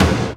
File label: TOM, Drum Dry; East Collexion; Drum 1